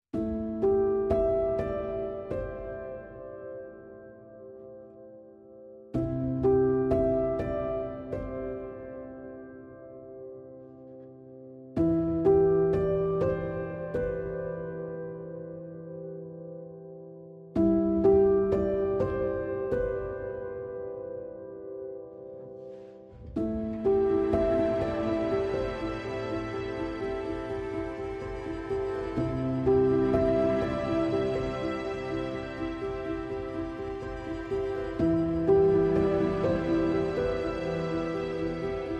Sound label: Piano and Strings Only